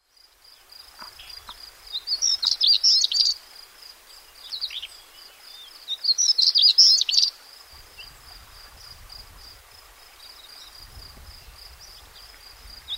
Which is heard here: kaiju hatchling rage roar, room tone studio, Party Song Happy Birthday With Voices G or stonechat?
stonechat